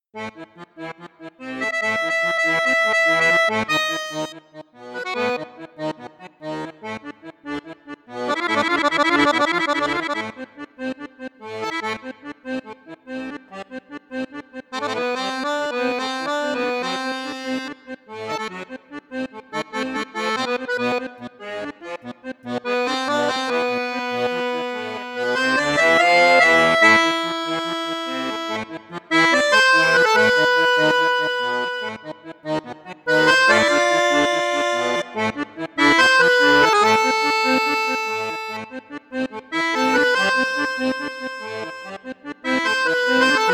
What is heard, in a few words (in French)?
registre 16 8, accordéon; borsini concerto medio